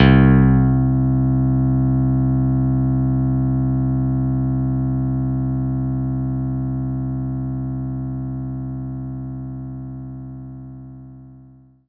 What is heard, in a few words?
CP 80 EP C2